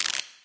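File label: electricity; smite; kuzenbo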